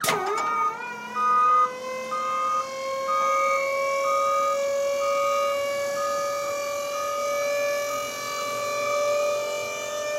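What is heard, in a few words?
ladder extended automatically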